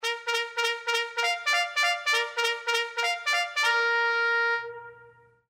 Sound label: cavalry bugle charge